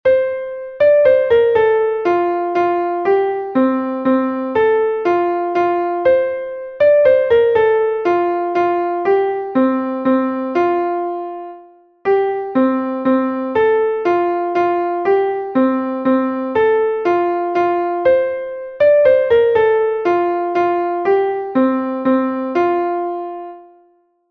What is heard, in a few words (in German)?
klavier; MelodieMeister